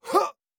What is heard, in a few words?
Attack Grunt; Afterbern Democralypse; Windows